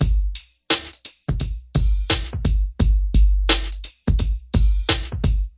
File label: sleigh hiphop, 086 bpm, drum loop